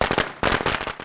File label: cookie attack